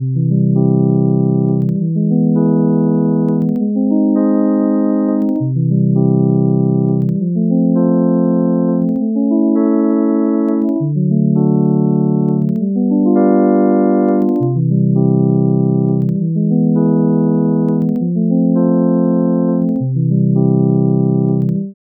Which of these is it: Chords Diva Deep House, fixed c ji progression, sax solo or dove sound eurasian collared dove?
fixed c ji progression